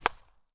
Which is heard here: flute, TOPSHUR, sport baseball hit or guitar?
sport baseball hit